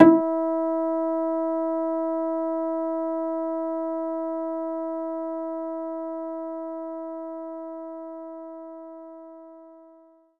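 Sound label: Acoustic Bass G sharp 3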